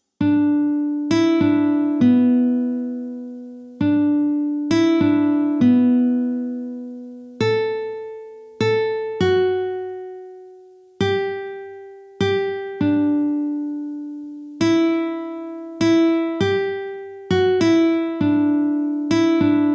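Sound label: Silent Night Easy Normal; Slient Night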